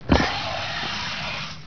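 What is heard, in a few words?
slide door; Weird - The Truth Is Stranger Than Fiction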